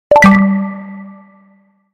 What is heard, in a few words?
new message